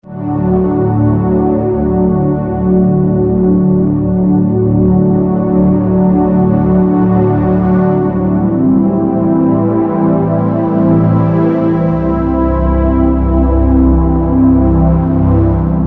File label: Underscore PAD; Worship Flow